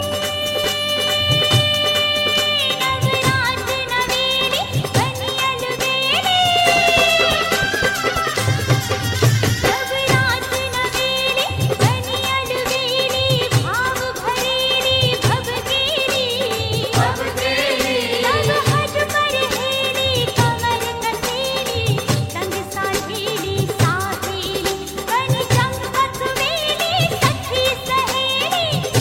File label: Gujarati Dhamaal